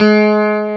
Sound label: GUI STRATO, E-Guitar; E-GUITARS